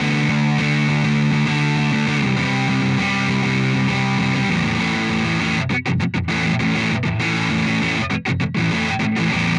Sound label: Pushing Riff, Rock Guitars